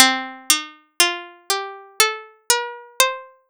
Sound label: scale; 6ado